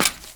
Jester Step Default, Grineer Royal Jester Foley Footsteps; Warframe